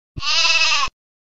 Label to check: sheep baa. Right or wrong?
Right